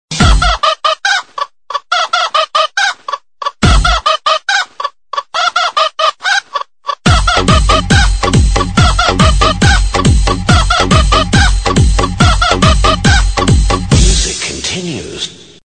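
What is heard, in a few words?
chicken crazy dance ringtone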